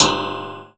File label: FRIDGERA, PERCMETALIC; Rarefaction; Poke in The Ear With A Sharp Stick